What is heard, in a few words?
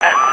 short wave spurt